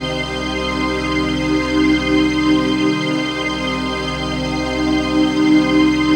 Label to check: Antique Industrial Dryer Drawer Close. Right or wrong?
Wrong